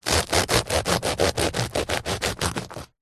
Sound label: food bread loaf crispy paine de casa cut with bread knife